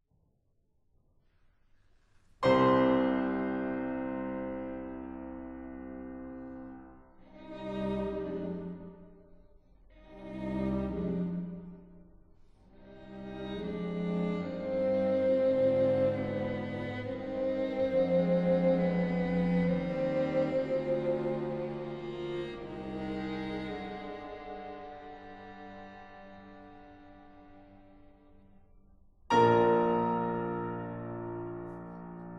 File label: Piano Quartet No.3 c-moll; Brahms; Op.60